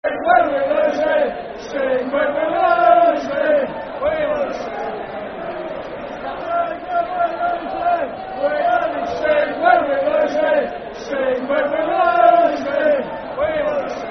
Sing When We're Losing, Football Chant (Soccer Song); Brighton Bhafc